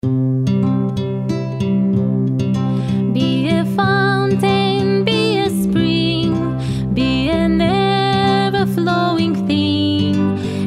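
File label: Song, English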